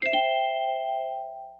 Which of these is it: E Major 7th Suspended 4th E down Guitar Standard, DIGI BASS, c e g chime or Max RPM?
c e g chime